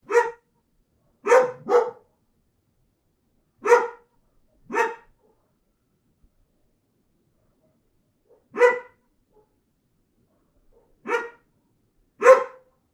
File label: dog - mixed breed; animals; NS Studios - ultra sound collection